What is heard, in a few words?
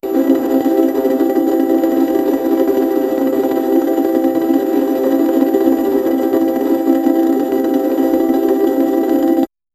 slots machine spinning th, slots